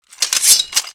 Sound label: metal